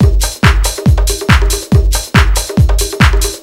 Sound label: Techno Drums; Techno Music Maker